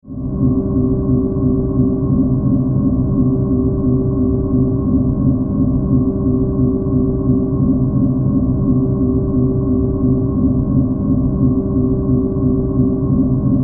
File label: Force Field, Force, Field, Modulation, Drone, Low